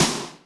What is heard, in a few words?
snaredrum, Yamaha RM 50; Drum Samples; Snaredrums